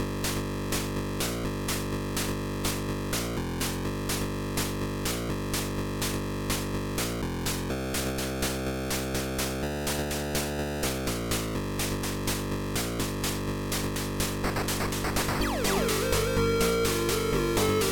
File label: thrust